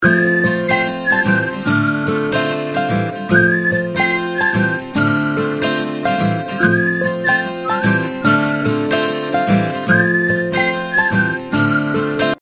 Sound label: whistle